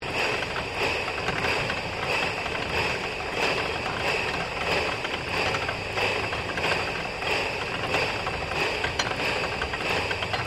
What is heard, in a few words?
ENGINE MODEL TRAIN OPERATING LOOP; Various machines; Machinery